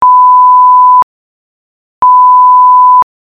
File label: Tone